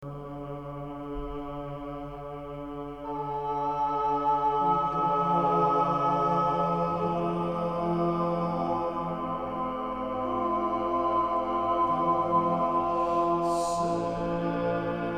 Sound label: da pacem domine